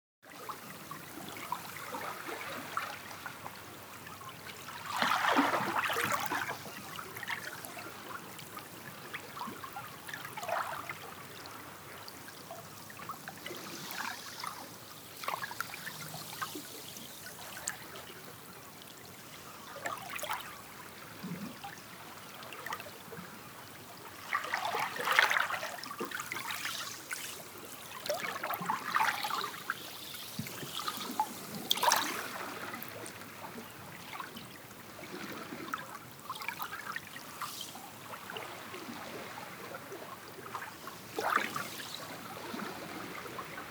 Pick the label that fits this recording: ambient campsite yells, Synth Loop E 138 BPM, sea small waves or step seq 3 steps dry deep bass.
sea small waves